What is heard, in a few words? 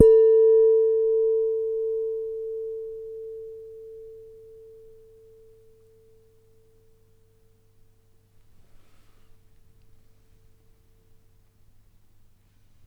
Soft Hit, Large Wine Glass